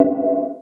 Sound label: Target Enemy; Live Realm